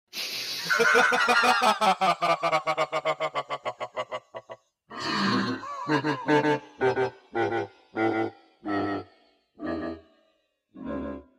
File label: laugh